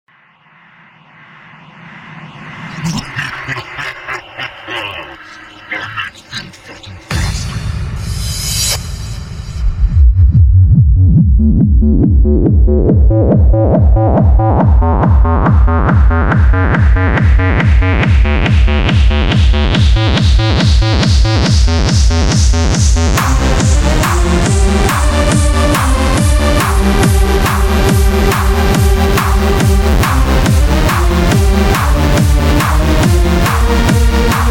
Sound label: techno